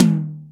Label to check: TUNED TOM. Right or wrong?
Right